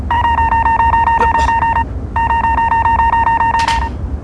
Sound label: car phone; bubblegum crisis; daly's car phone